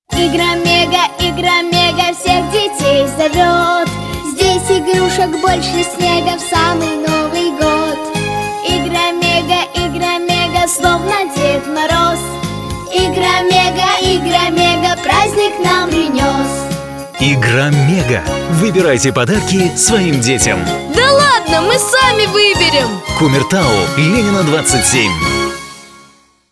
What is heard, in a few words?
Jingle Bells; Igra Mega